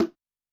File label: Bongo; Yamaha DTXpress-II; Perc